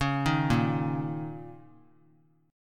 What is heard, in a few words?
A sharp Minor Double Flat 5th, A sharp, down; A#mbb5 arpeggiated; JGuitar